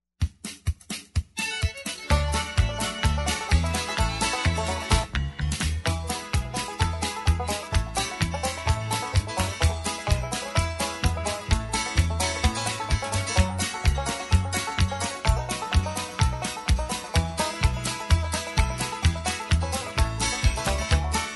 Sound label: banjo brigade extended, square dance music